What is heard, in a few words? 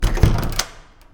Door